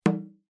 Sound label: sang drum